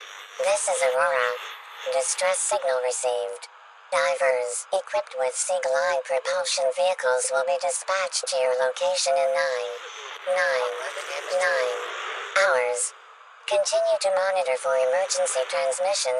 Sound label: Radio Bounce Back